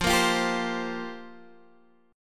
F Suspended 2nd Suspended 4th, down, Guitar, Half Step Down